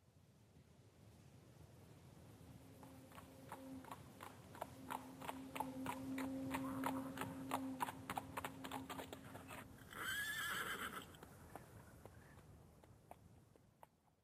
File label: Cowboy Ambi; Pitch Black Audio Pong